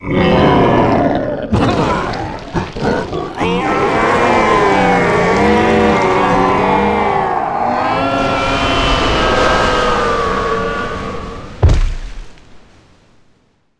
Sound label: Laugh